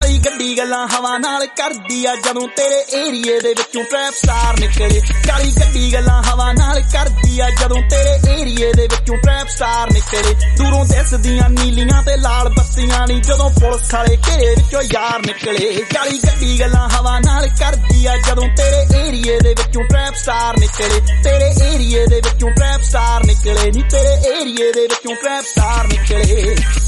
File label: punjabi